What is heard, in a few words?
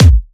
rich high end kick drum sound a key, steel kick drum sample tuned to the A; kick drum samples